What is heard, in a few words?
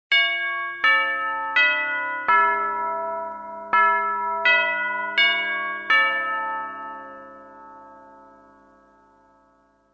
Westminster, Deurbellen